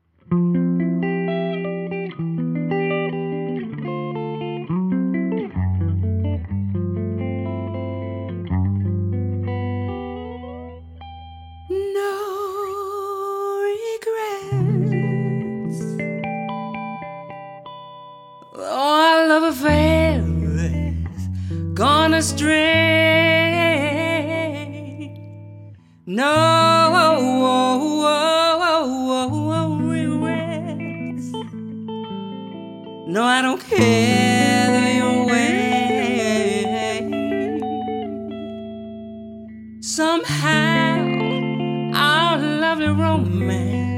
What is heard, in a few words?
Guitar and Female Vocal; Swing